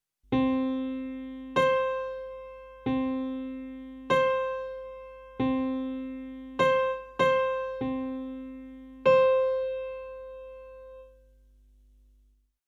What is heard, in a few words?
music